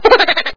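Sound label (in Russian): хихиканье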